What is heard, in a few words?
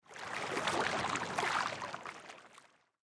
Large Pool; Blind Quest - The Frost Demon